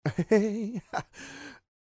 voice laugh

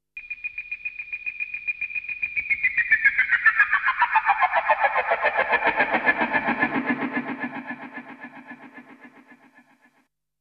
stereo beep

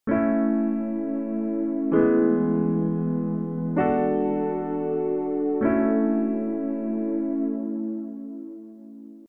130bpm keys; plugsound

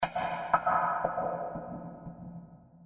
perc

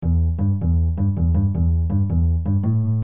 bass

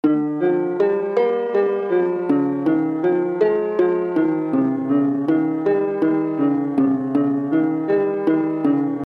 melody

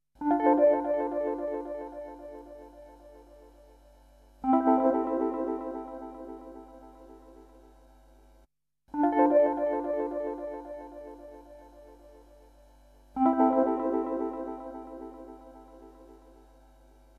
epiano

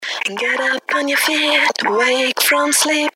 voc

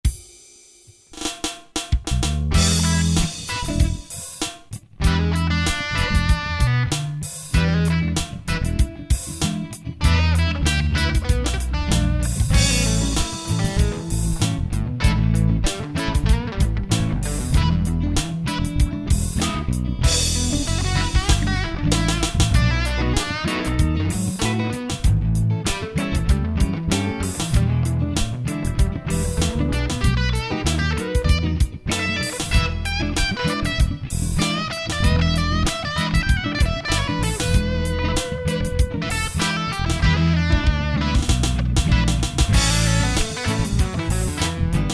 blues

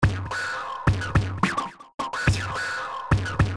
drums

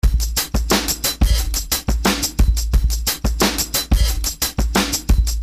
beat

